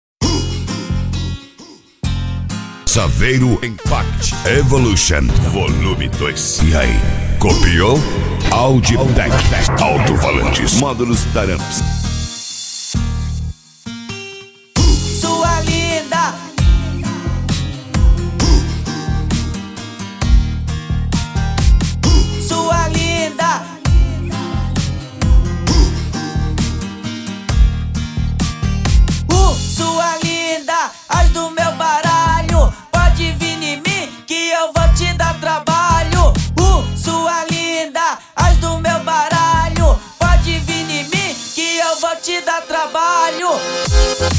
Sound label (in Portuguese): eletro funk; saveiro impact evolution vol2